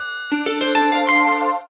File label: win; Amatic; Casanova